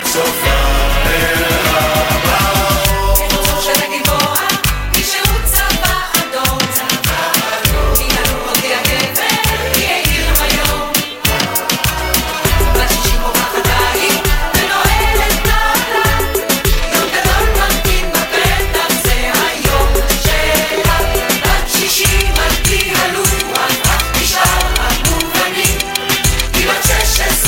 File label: hebrew